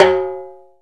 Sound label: lo timbale rim